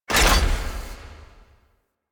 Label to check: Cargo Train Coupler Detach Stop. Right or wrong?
Right